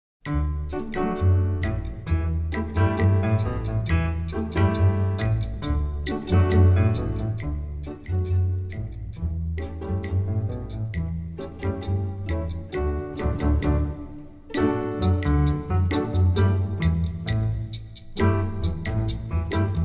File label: Calypso Sea, Orchestra; Lakeside HS Orchestra